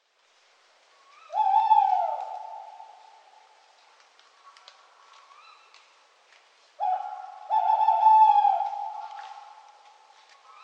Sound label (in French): Hulotte; nuit de la chouette